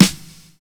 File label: BRUSHING; Univers Sons